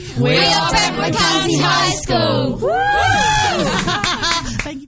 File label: brentwood county high school chant